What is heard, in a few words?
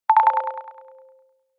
Gauge Stock Notification; HTC 10; Sense 8 Sound Suite